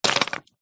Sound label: foley metal pole solid drop onto stones